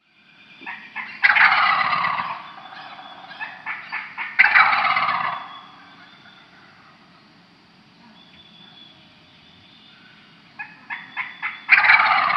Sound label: wild turkey